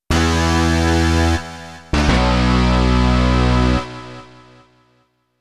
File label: E guitar